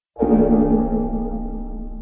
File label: Cloister bell; tardis